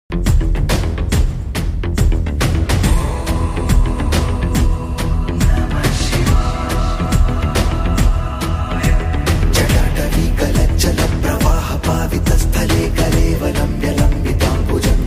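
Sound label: shiva tandav stotram harmonium; mahadev mahakal shiv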